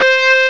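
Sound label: Overdrive Guitar (C4); X-Wing; X-Wing Patches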